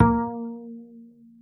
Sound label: acoustic bass vib A#3; Masterbits CLIMAX 7 - Bass